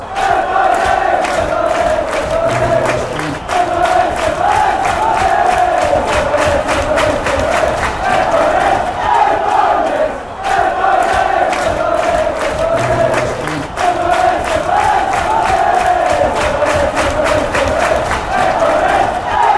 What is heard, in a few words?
inverness inverness inverness